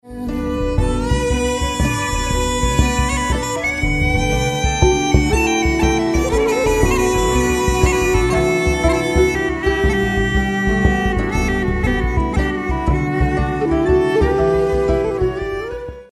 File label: pipe bodhran